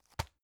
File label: tissue package drop soft